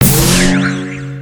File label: Bouncers